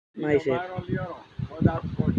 Baby Crying

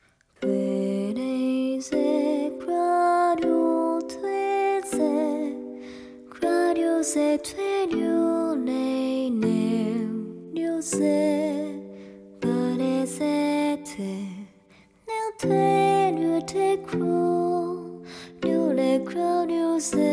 Tears Song

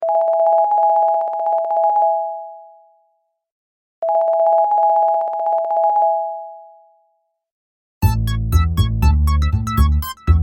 Melody Sms

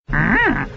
Hum; Yoda

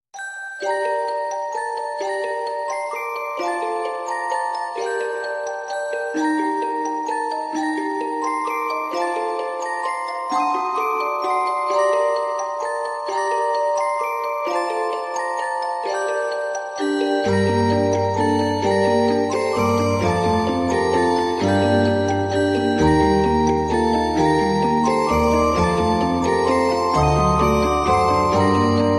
Music Box; Sayakas

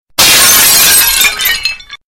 Glass Breaking Sms

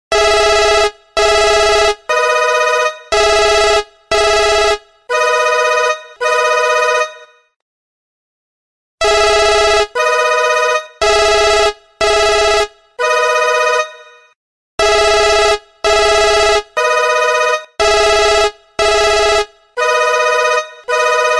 3d Alarm Clock; Nokia

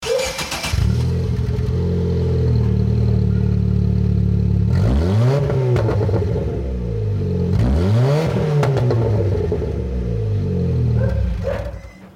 Chevy C3

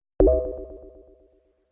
GameCube Sound

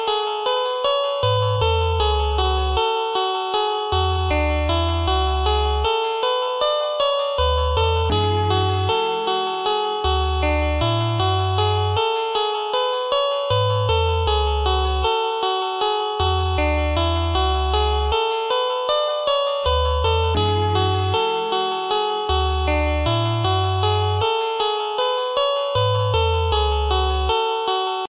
Romantic Ringtone

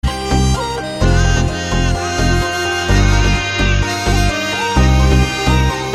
Remix Tone

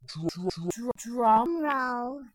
Drum Roll

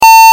Beep

